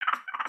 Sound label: Pets Skunk Idle Short; creatures pets; Lego